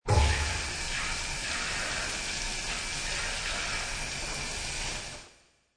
Man pulls paper towel dispenser level and then tears off a p; Man pulls paper towel dispenser level and then tears off a paper towel in a public bathroom; Public Bathroom Sound Effects